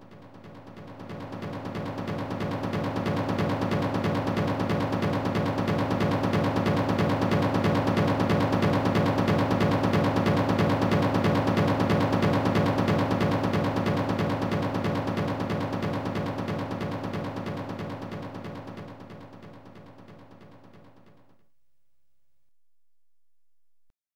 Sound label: Drums; Sensible Soccer European Champions v1.1; CD-32; Amiga